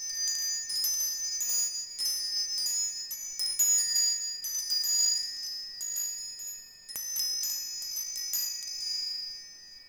aluminum chimes; WAR

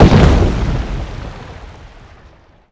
medium impact generic; WAR

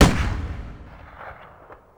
m1 garand; WAR

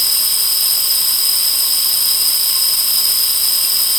pipe steam; WAR

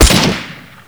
light rifle shot; WAR

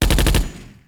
mg-42 burst; WAR

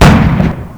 stug fire cannon; WAR

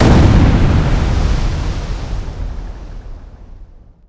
small water explosion; WAR

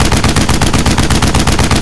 50cal loop; WAR

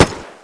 m3 ringout; WAR

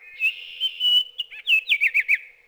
oriole

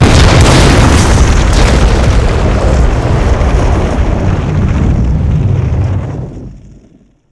train explosion combo; WAR

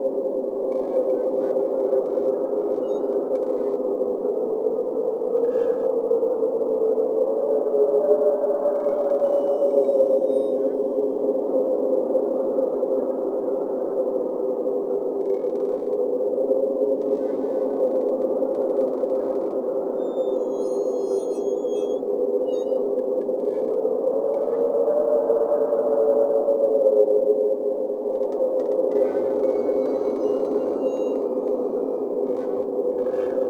wind ghost town; WAR

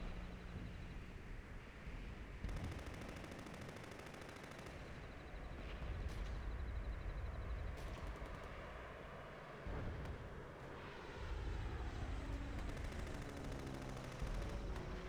airfeild; WAR

